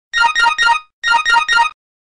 Motorola Phones

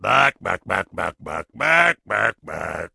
Wow Orc Chicken

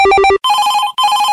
Wake Up Nokia; Nokia 2013

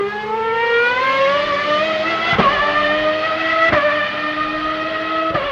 F1 V12

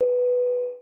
Airplane Ding

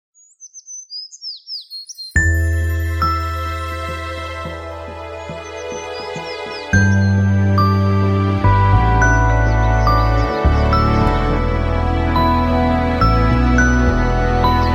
Garden Tone

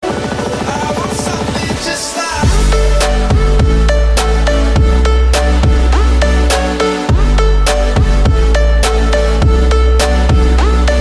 trap mix

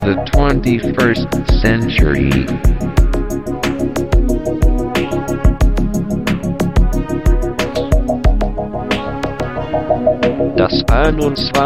Loop; Expo 2000

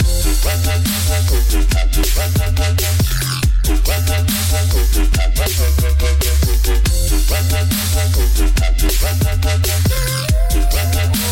Dub beat

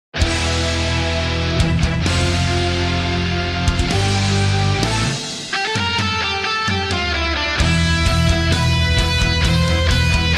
Metal; SOTC

